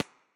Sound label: Clap; smite; cupid